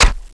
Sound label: knife hit vest